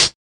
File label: clear hat g key